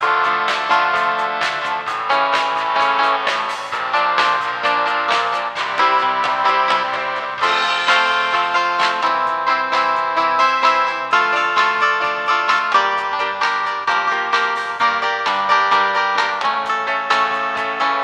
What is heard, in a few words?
Jukebox Rock